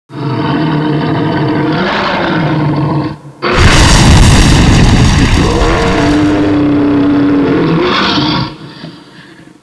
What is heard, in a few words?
firemonster burning